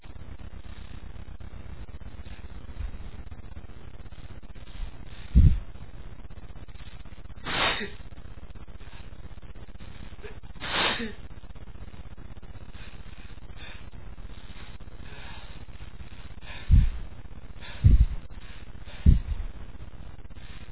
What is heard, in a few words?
freshly cut grass, male, achoo